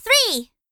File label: collect; hat kid; collect three; a hat in time